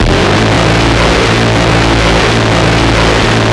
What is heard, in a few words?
GAU8 Cannon Low; Weapons; DCS